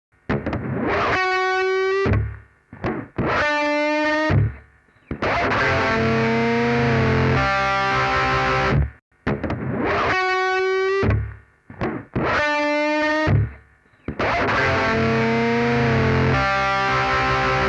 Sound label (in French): guitare solo